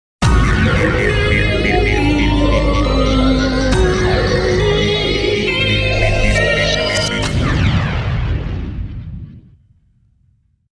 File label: Timeup (Goal Jingle); Initial D Arcade Stage; sega